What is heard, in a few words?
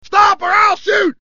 shoot; hl2dm